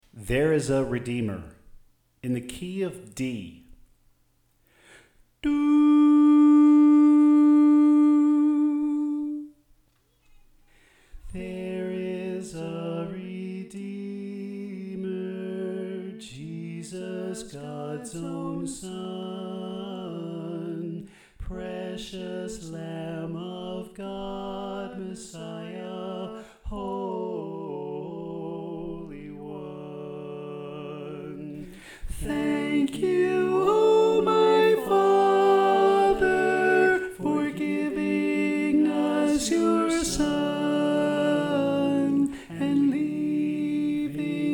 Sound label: Alto